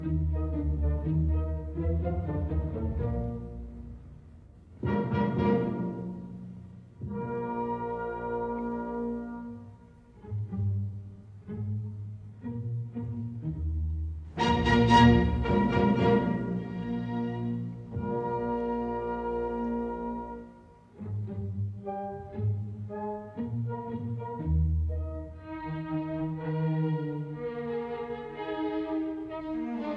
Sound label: Symphony in E flat major Allegro molto Poco andante Presto; Symphony No 3; Op 55; Eroica; Bruno Walter